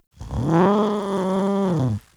Kavat Vocal Idle; Warframe; Khora

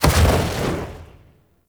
Vial Ability Fire Shatter; Warframe; Warframes; Alchemist; Elements

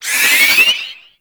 Hand Bomber Proj Warning; Warframe; ManInTheWall

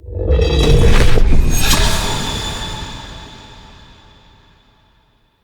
Healing Aura Cast; Bromius; Abilities

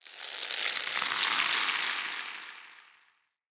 Under City Vine Creak; Ambience

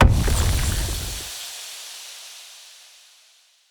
Fey Mist Impact; Powers

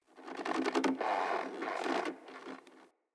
Tent Rope Creak, Interior; Soul Plane

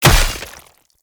Great Sword Impact Flesh; Weapon Impacts; Founders Great Sword; Combat

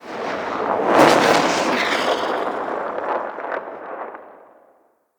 Arrow Barrage Whoosh; Level Events; Midrath; Warsong Prologue

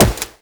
Wall Jump; Footsteps; WallRun; Warframe; Characters; Tenno

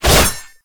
Bayonet Melee Whoosh; Warframe; Tenno

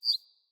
Bird Single Chirp; Galene Bird Guide

